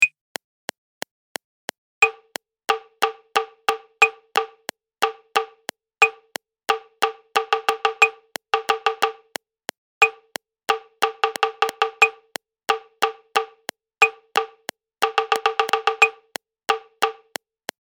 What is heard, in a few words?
Prepared Rhythm; Practice Sheet 6; advanced musicianship